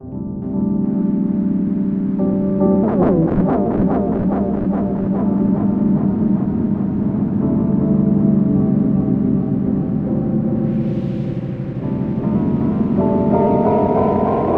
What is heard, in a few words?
Rhodes Delay Automations